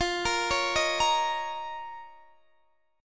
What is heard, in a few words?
F 7th Suspended 4th Sharp 5th F down Guitar DADGAD